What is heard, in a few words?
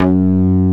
MOOGY BLUES, BASSOUNDS; Masterbits; Dance Trax